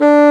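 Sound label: WIND BASSOON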